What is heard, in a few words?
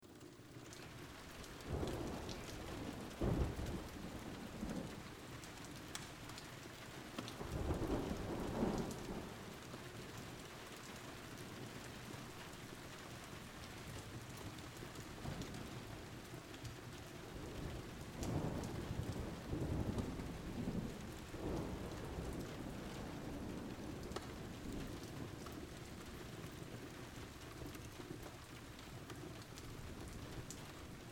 rainy day by fire, ambience; Rainy Day in Cabin; Writing Ambience Tracks